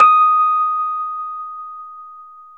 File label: Rhodes 73 Slow